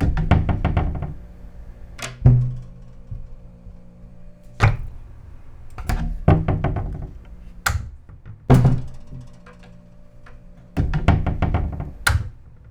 Cupboard Rattle, kitchen

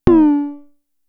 Tom, Toms; Fricke Schlagzwerg; Drum Machines

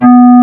BRASS SUS

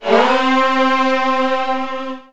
C2, Virtuoso Strings; ILIO; Kirk Hunter Virtuoso Strings VOL-2

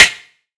claps, dance drum kit

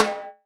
Roland Juno D Perc Menu Kit

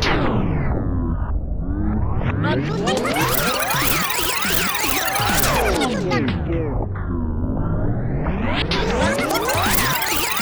TAPE RW-FF; Distored Reality

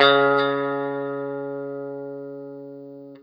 Guitar & Bass; Quantum Leap; 90's Sample CDs

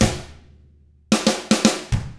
110 SAMBA, Drums; ILIO; Double Platinum Drums 1

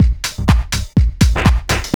house loop

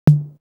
Tom, Drum One Shots; AMG - Coldcut's Kleptomania VOL-1

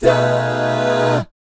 B13B5, Jazz, 2 GUY CHORDS; ILIO; Vocal Planet VOL-3; Jazz & FX

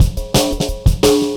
175, Breaks; Drum&Bass; EZ Rollers

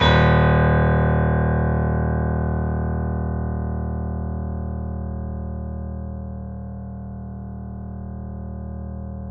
D#, The Piano; InVision Interactive - The Piano

